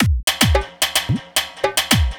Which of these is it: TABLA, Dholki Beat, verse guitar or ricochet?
Dholki Beat